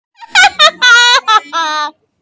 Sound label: devious laugh